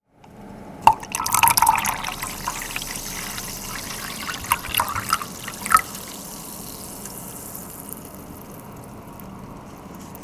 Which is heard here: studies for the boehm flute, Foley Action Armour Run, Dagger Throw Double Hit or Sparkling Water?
Sparkling Water